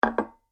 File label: Dessert Bowl Hits Table Surface; Dishware Sound Effects; Household Sound Effect; Andrew Fleming